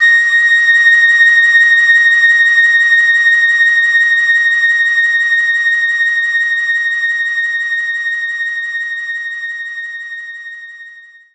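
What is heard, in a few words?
Flute A#6; knights try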